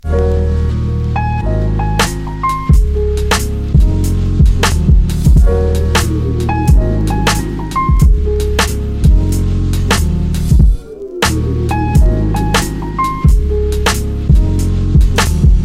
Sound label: Jazz Hangout